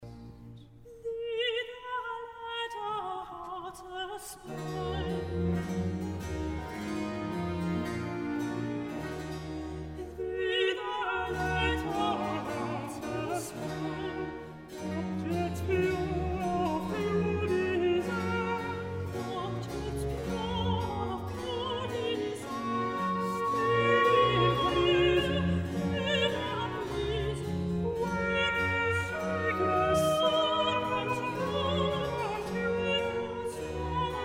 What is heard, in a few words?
Air and Duet; Streams of pleasure ever flowing